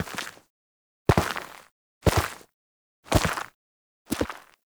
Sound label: Steps; Sacrifice Shrine Intro; Ballas; Cinematics